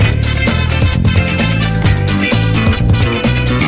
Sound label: Super Star; StormatuaryCastle; smbx38a; The Great SMBX 38A Contest II